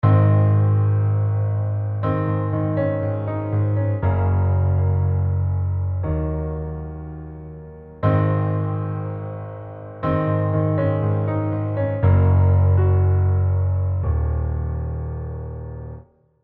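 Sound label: EZkeys 2 Session Grand Big Ballad, piano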